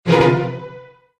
double jolt; Looney Tunes - Avalanche Snowboarding

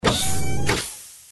exit open; Danger Mouse - Ultimate

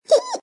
teddy hit; Danger Mouse - Ultimate

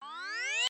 recover; Ben 10 - Tomb Of Doom

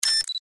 cannon enter; Danger Mouse - Ultimate

